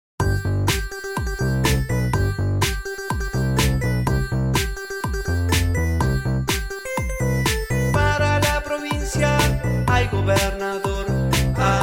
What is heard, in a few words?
jingle; kicillof; ataque ochentoso para visualizar su campana